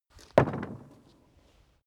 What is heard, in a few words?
carriage hatch single faint